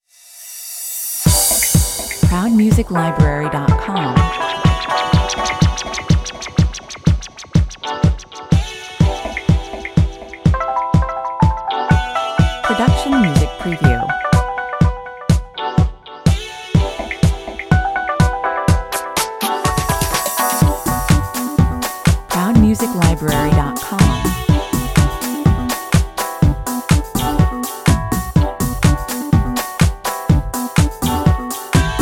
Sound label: back 4 the groove 124 bpm